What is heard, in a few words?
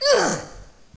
pain; alienarena; femforcer